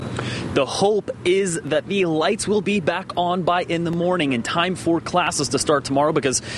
explosion

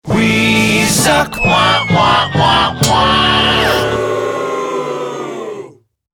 Jingle; We Suck

